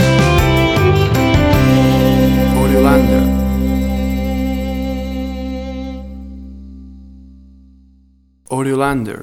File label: Country Rodeo Cowboy Ballad Music Stinger